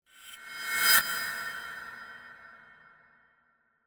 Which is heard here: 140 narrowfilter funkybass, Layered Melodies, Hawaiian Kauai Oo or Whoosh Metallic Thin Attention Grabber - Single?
Whoosh Metallic Thin Attention Grabber - Single